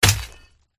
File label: iguaron attack contact up